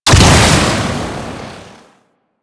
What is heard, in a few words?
Bow First Explosion, Weapons; Warframe; Corpus